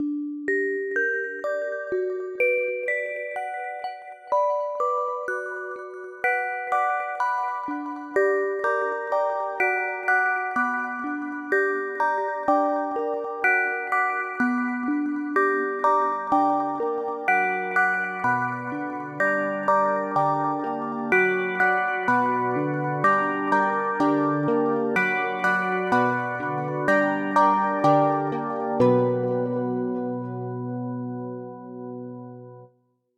Memories

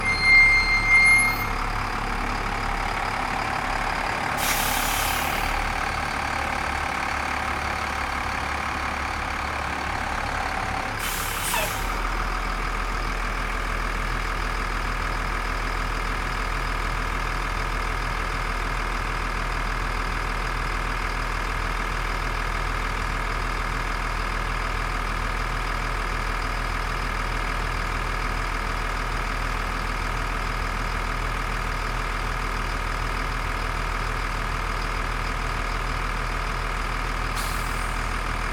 Bus Coach Ext Pull Up Brake Air Release Idle

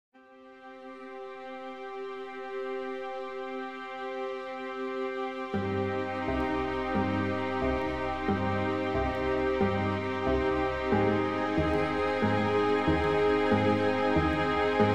Subtle Intro

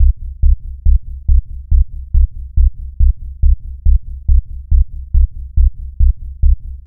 Sub Bass

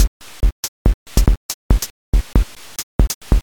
C64 Beat 140

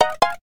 Pause on

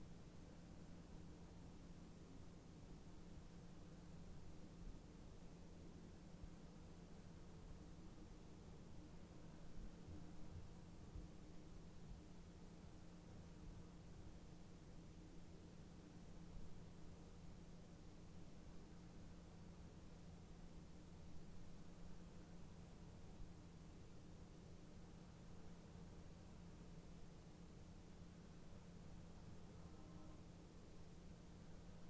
Room Tone Stairway

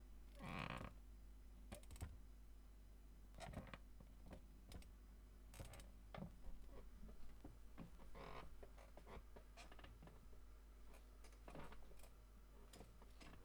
Chair Squeak

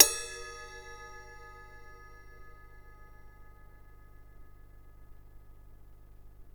Cymbal Bell

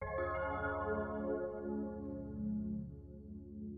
Soft Pad

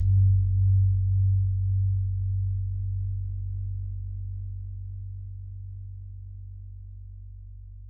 gong

